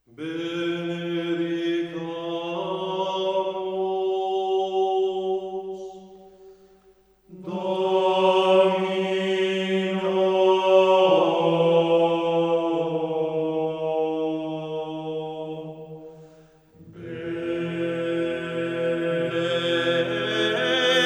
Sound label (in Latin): Benedicamus Domino organum